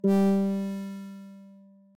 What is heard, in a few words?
Soft Notification